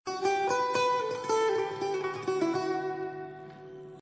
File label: setar